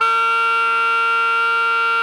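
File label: SOPRANO A#3, Saxophones, SOPRANO GROWL; GRWL; Greytsounds; Philip Wolfe David Crozier's Saxophones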